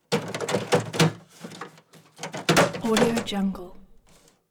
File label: wood crash rattling rummage some plastic handle; prosoundfx